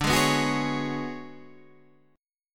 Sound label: B Minor 6th Add 9th D